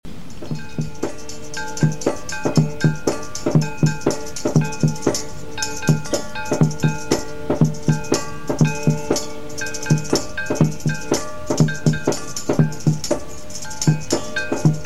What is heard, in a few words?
Drums and Accordion